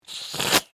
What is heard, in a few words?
Suction Mud, Texture, Suction, Mud; Wet; Water; Nature; BlastWave